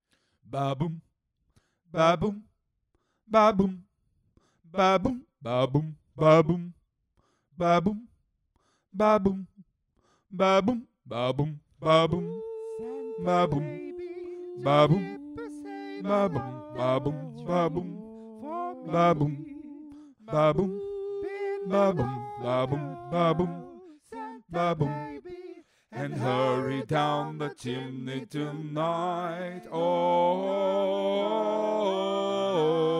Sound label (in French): basse